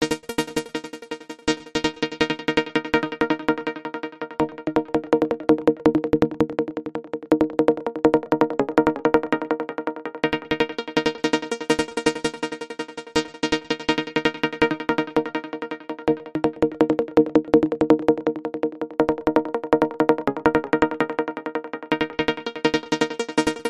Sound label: Chatter Mix